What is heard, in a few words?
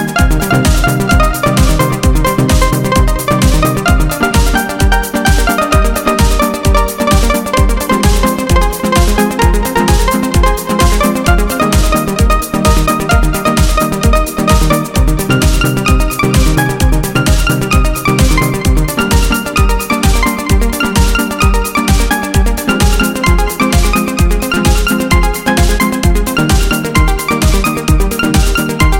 House Music Melody